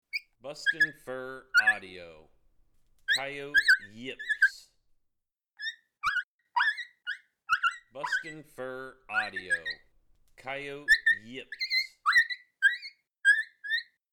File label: Coyote Yips